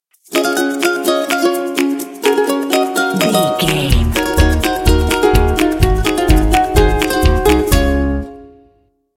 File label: tropical fun stinger